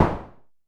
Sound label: Drum X Clap; Pearl Drum-X